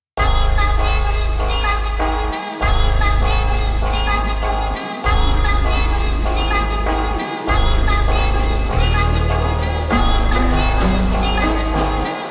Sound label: brazilian phonk party slowed